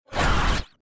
katana slash

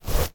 tissue take

